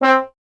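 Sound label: bass trombone C; Miroslav Vitous; Solo Instruments VOL-1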